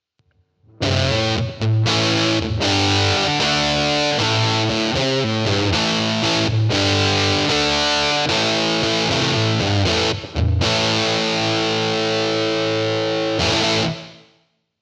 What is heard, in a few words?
Valeton Dapper Indie Fuzz Room